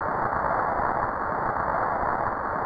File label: Rain In; Rain heard from indoors (left and right channels - both loopable); Zelda